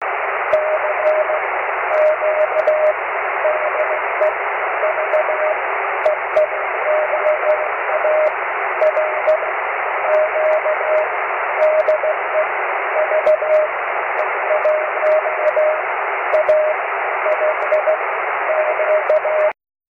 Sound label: cw; ve1alq; 23cm